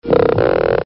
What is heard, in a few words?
frog